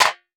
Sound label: Impact Pan Tin Hit By Plastic Tube